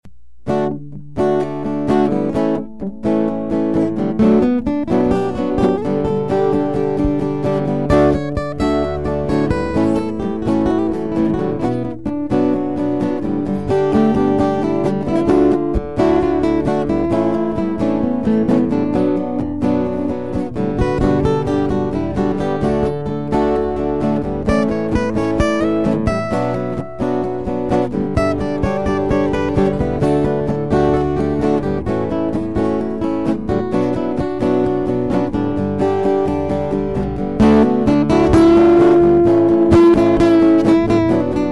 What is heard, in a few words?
tape end, guitar amp; Matthew's Amplifier Project; The tape was pretty nearly out, so I played around for a minute or two